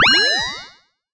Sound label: Retro Magic Protection